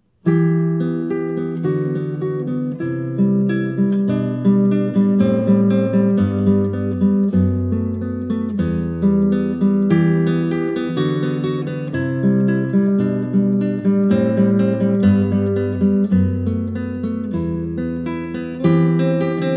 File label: Classical Guitar